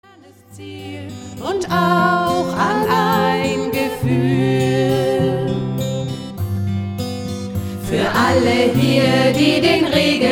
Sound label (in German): gitarrenchor